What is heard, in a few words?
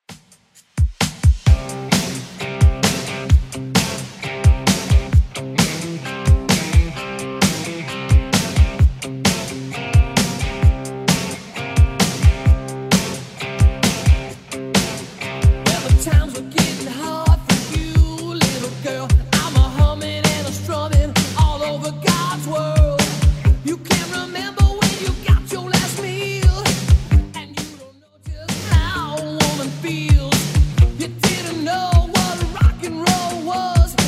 80s 131bpm; Redrum